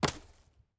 lumber tree

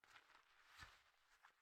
hoe, farming

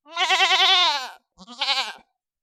goat, death; fauna

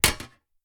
arrow metal, attack impact

